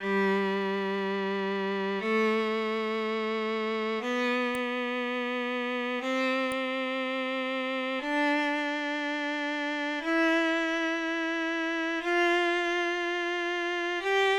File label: Test Violin Tone